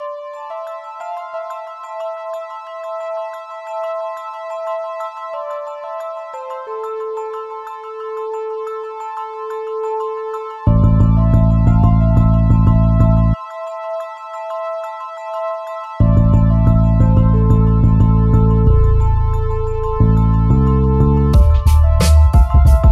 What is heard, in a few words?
Intro Outro Sequence 90bpm